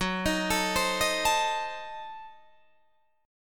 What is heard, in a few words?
Gb Diminished 7th Guitar Open D